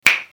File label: clap